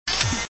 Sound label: Switch; FluddSwitch; Super Mario 63